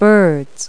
Birds; Multi-Lingual Talking Picture Dictionary

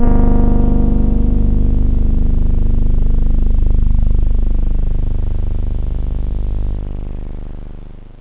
hi synth; OctaMED SoundStudio V1; synth misc

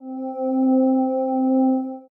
string

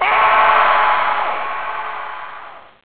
ARGH REV

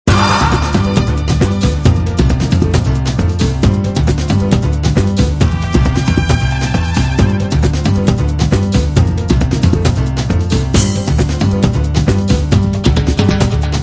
SAMBA; jungle jiggy

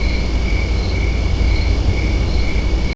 CRICKETS; thief

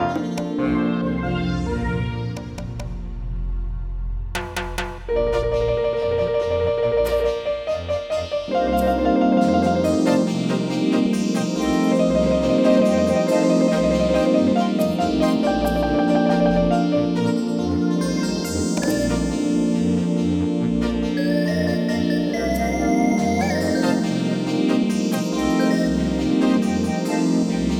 SAMBA

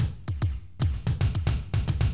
rave Gourd; SGI O2 Out of Box Experience; jungle Island; Cyber Gourds

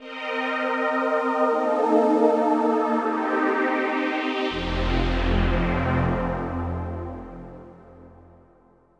synth pad ambient 160 C; Sphere; eJay

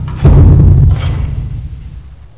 plat; Hexen II; plats

